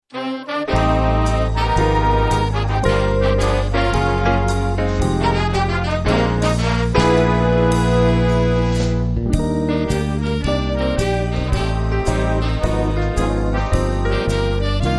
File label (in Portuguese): orquestra bossa jazz